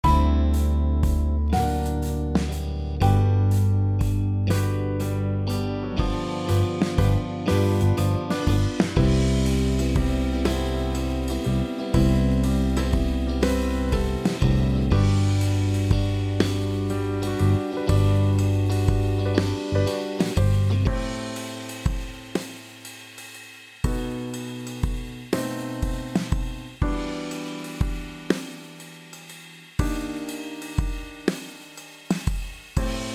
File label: g solo part